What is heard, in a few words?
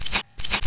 usp silencer off, weapons